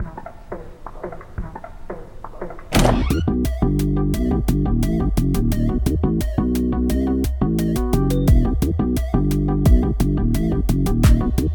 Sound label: Instrumental; Irregularity; Trick Or Treat; Alien Hominid; Friday Night Funkin' Halloween mod OST mod Windows gamerip 2022